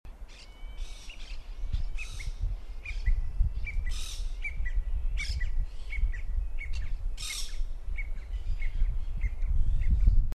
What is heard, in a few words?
Xiphocolaptes major